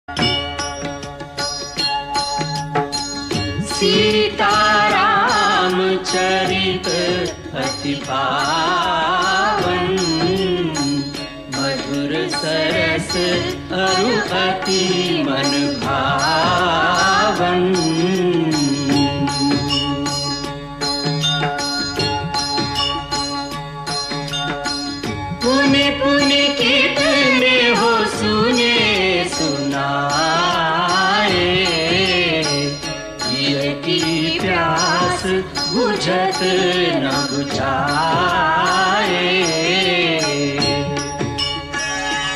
Bhajan Ringtone

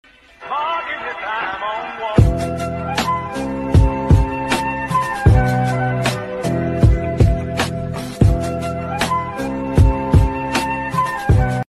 English Mass BGM; ringtones

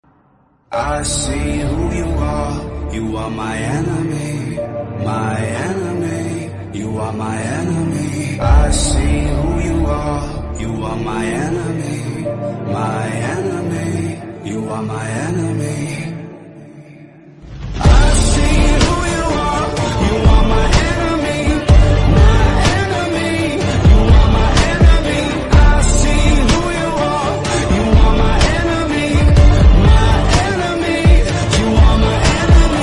English Song; ringtones

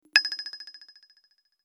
Droplet Ringtone; ringtones

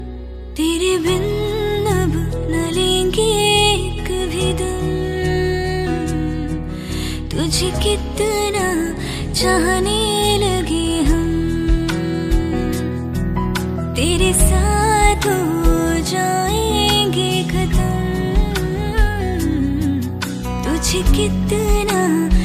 Female Version Ringtone

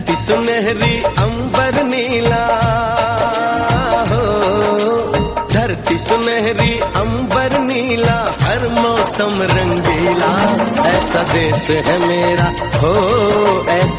Desh Bhakti Song Ringtone

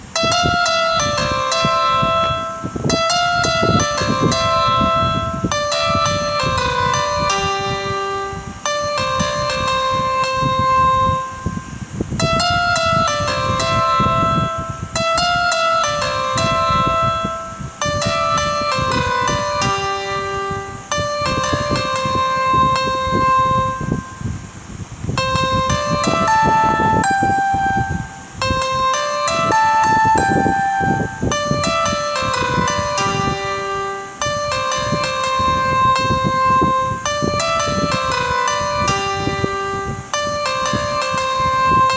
Ringtone Instrumental Piano

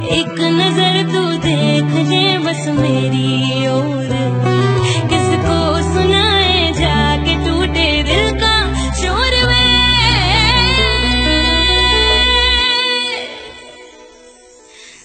Sad Song Ringtone

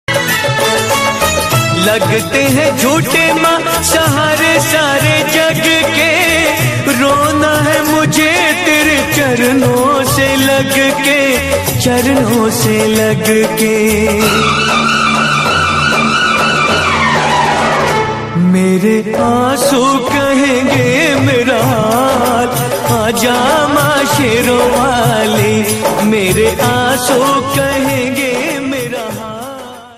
Navratri Song Ringtone

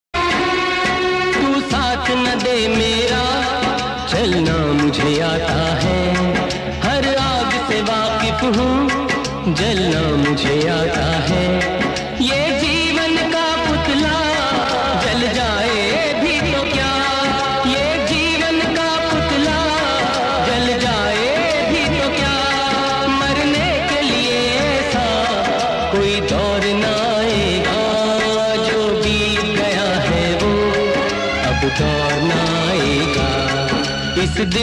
Old Hindi Song Ringtone

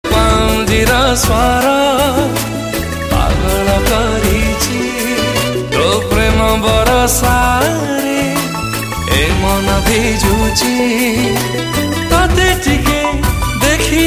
Odia

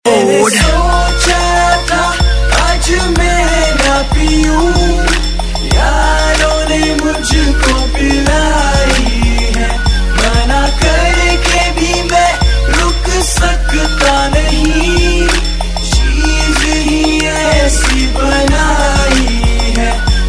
Bollywood Song Ringtone

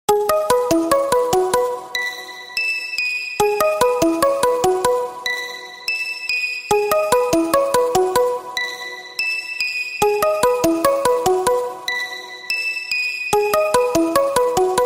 Samsung Caller Tune Ringtone; ringtones

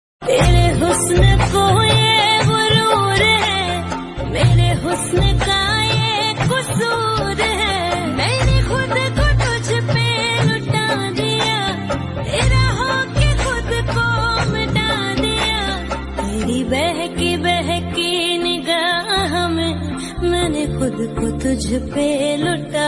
Female Version Ringtone

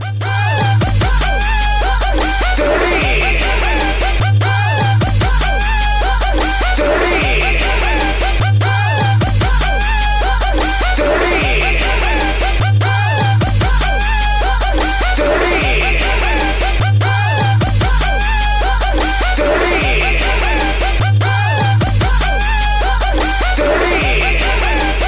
Ringtone; Vedalam

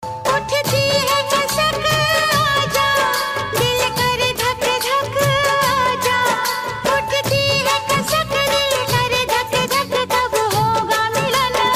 Old Song Ringtone

